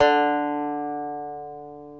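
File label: reference pitch banjo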